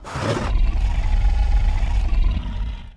idle, sonak; giants

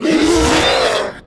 CLAW; offspring; giants

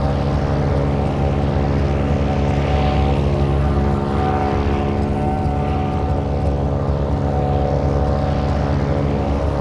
jetski; giants

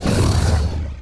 SNORT; giants

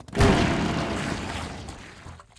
jetski rpm; giants

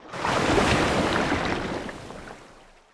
jetski; giants

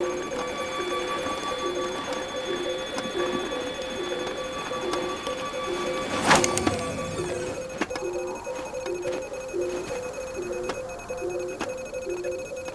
Pad; giants

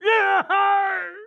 die; reaperman; giants

